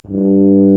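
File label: brass, TUBA C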